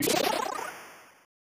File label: Beam